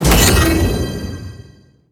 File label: pandoras box